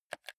console controller r1 press

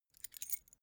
key ring small pick one

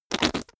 whoopee cushion fart

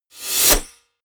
sweep

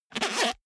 zipper small case open fast

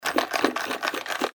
box being shaken

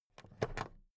plastic clasp handle open soft

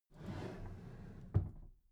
packed drawer smooth clatter close soft halfway

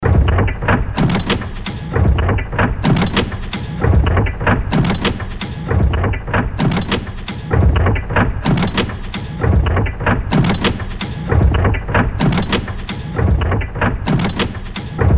industrial loop

